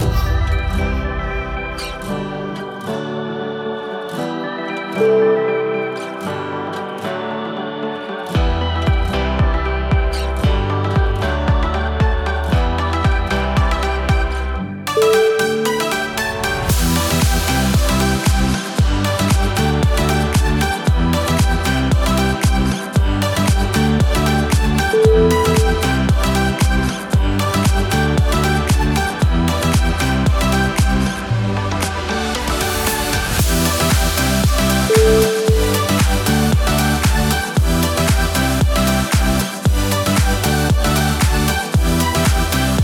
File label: upbeat summer party pop